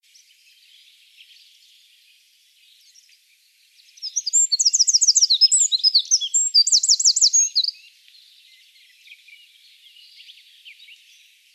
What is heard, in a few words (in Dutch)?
Winterkoning Troglodytes troglodytes